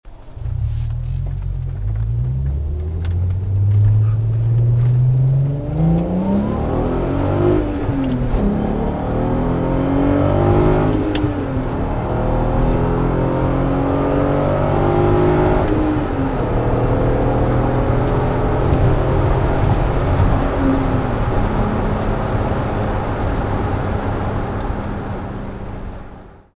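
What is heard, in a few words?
magnaflow incar, exhaust systems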